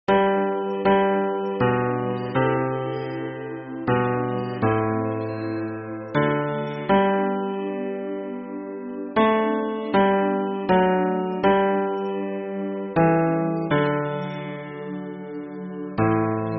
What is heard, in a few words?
hymn bass